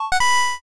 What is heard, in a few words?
load